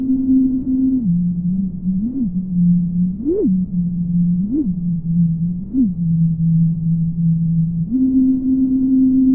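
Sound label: pink, Sound sonification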